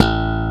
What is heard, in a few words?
SLAP; E-MU Formula 4000 Series Vol. 1; Hip Hop Nation; Phatt 4000